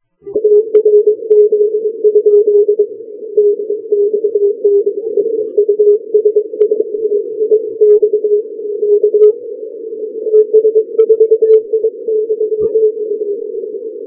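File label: 80m long path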